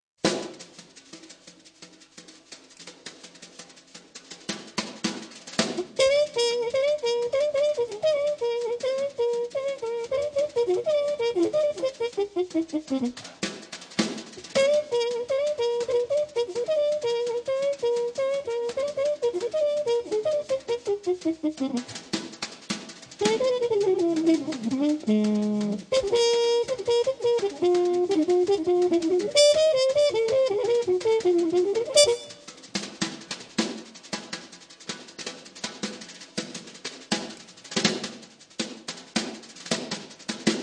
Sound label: brushes brass